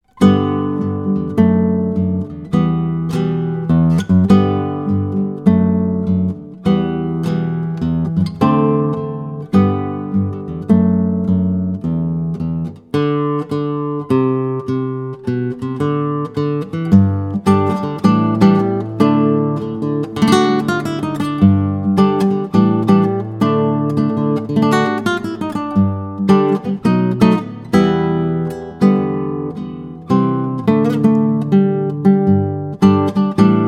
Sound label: Solo Guitar; Flamenco